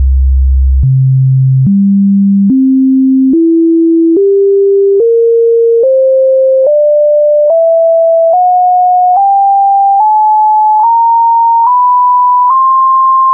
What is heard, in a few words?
Harmonic series